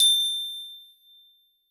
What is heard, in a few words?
Hard plastic f A5, keys; fleet keys 2 Samples